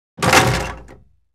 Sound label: Large object drop heavy plastic impact; gfxsounds